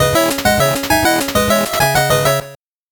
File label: 8bit jingle fast